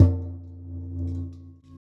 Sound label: EQ Perc, Percussion Mix